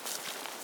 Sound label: Grass footstep